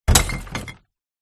household trashcan recycle glass bottle throw in on other rubbish